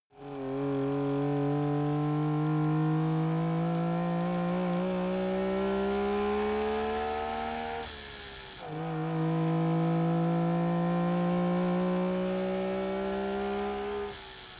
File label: car saab 92 drive engine